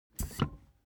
object chair office hydraulics down stepwise; smartsoundfx

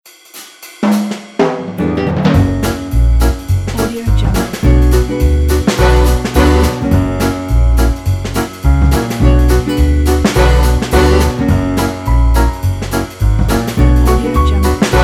peppy upbeat cheerful jazz piano

